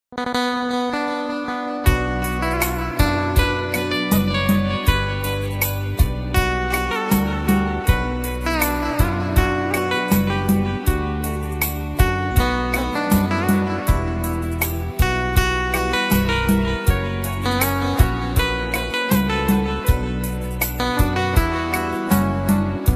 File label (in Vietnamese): Nhạc Không Lời Hòa Tấu Nhạc Guitar Hải Ngoại